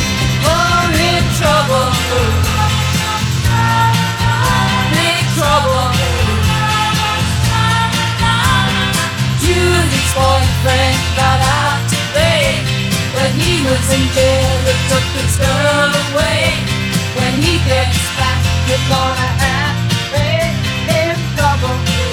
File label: Lead Vocal; 1975